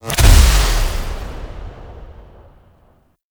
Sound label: Alt Impact; Weapons; Warframe; Tenno; Grimoire